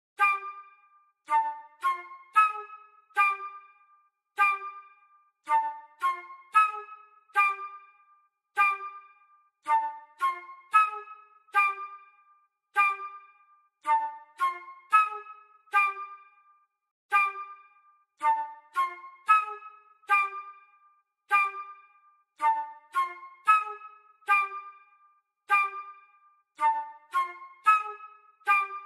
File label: Comedy Flute